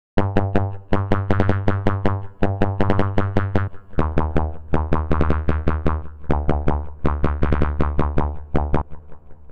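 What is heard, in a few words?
gate 21 16 steps resonance bass; the meditation soundset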